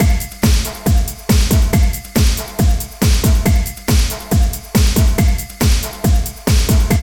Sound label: LOOP, Tekno Industrial; East West